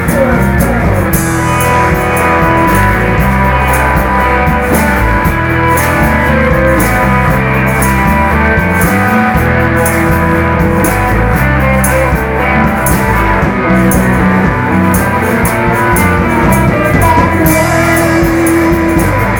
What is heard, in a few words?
back rd solo